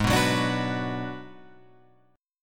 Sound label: Ab Minor 7th Flat 5th Ab, down, Guitar, Open F; Abm7b5; strummed